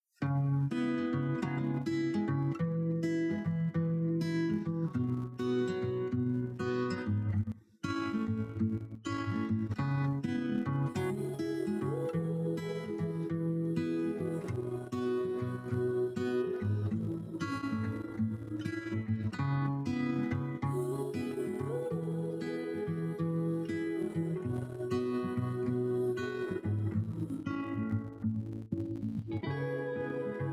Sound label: in the forest ambient acoustic guitar instrumental background music for videos